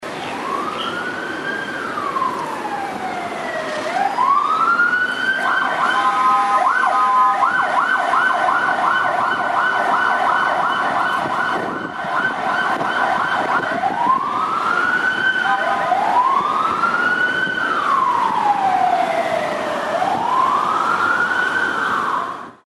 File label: Lisbon Emergency Ambulance